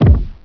THUD, 碰撞声音素材

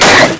CRASH, 碰撞声音素材

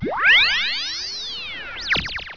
laser

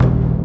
Last Hamm; Seewo; EasiNote5

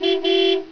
HORN, 汽车; 汽车声音素材

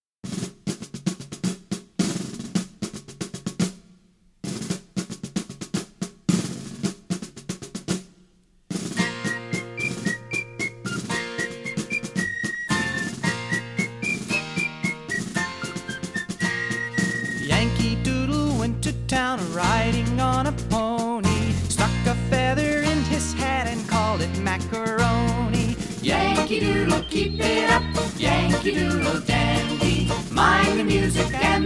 children's sing-along chorus - Yankee Doodle; disneyland

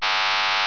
LASER; 激光

